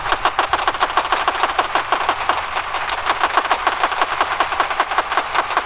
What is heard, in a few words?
silver perch chorus